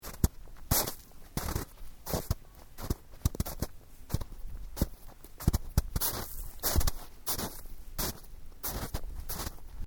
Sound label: Footsteps Boots Snow Sequence; Footstep Foley; Footsteps (Sound Effects:Human)